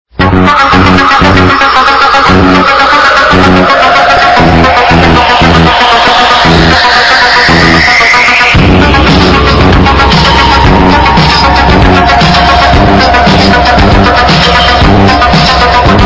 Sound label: bass; knightrider